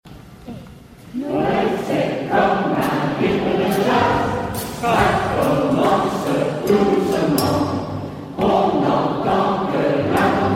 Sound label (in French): noel jazz; concert de noel a noyant